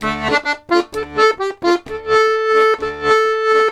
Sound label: Accordions; USB Soundscan vol.40; AKAI